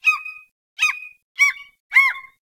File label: Beach Seagull; Warframe; Grineer Beach